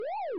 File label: siren